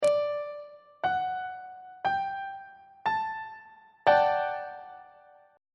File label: g major 7th suspended 2nd 2nd inversion on treble clef